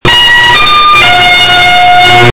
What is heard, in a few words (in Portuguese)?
Toque de retreta